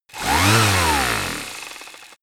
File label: power tool short; soundpool